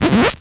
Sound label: Stylus Noise, Vinyl Noise; noise hiss crackle